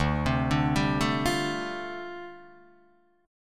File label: D Minor 11th D down Guitar DADGAD